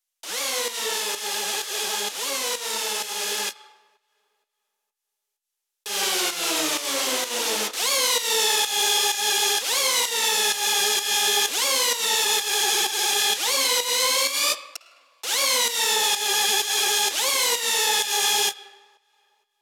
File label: 128BPM FX Crazy Glide G#; Vengeance - Essential Clubsounds Vol.5; Break the Chains; G#min